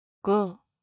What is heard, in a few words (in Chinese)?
骰子